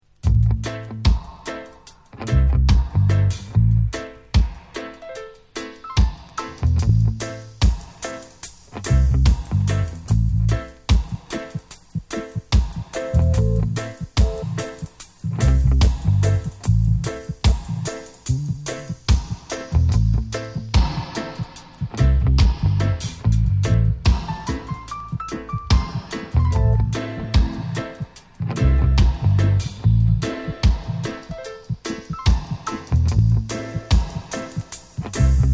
Instrumental, dub; Stones Throw

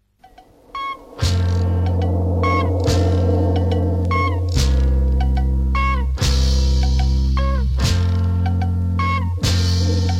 Ska Version Instrumental; Pressure Sounds

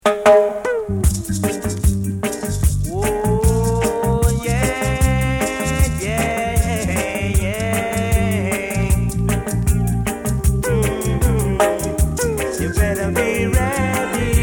Natural Reggae Beat; D-Roy

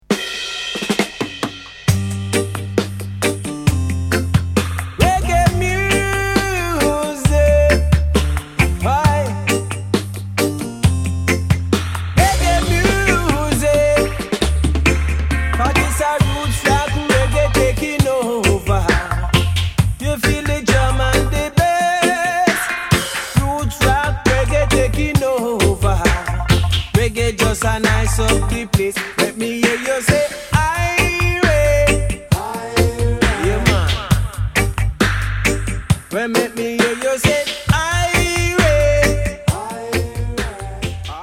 Reggae Music; Iroko

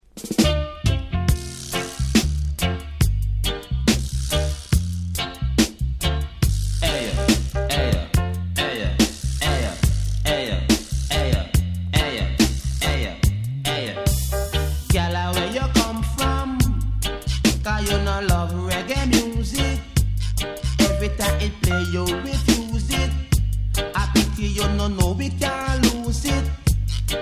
Reggae Music; Thompson Sound

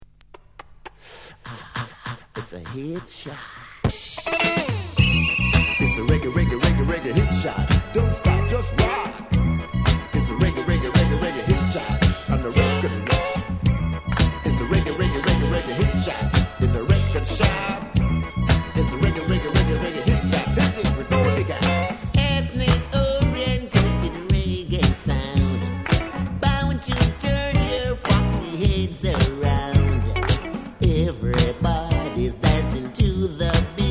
Reggae Hit Shot; Heartbeat

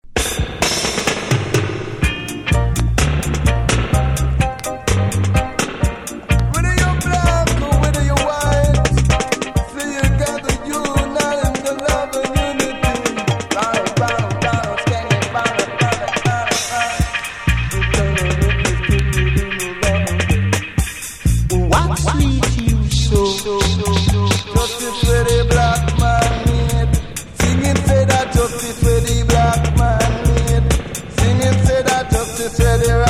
Power Chant Chant Dub; rub a dub style

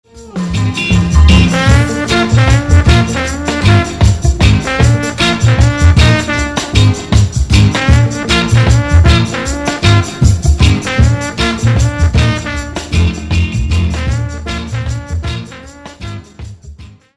Reggae Time

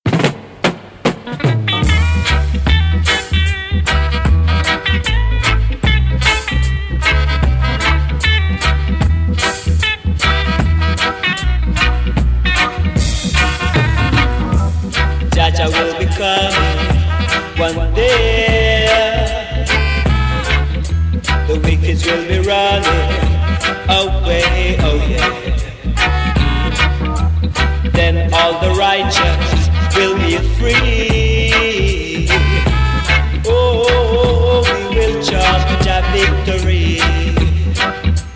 Chant

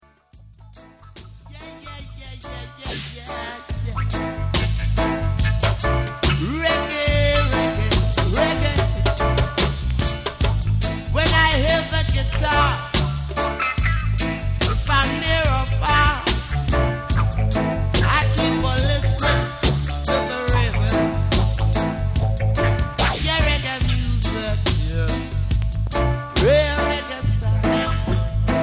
Reggae Sound

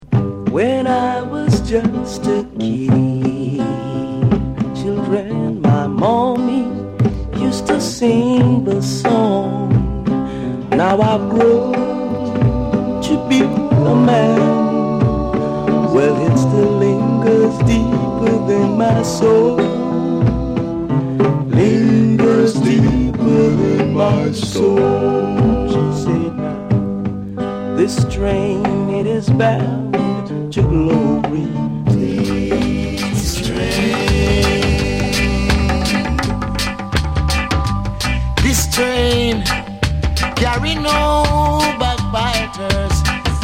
Reggae Train

